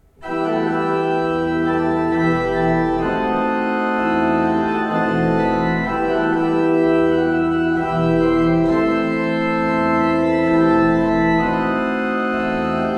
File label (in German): Stahlhuth, gebrauchtorgel